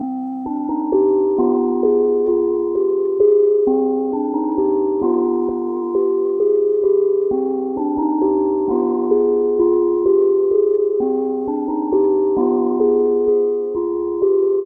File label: melodic loop 131 Cmin; judunno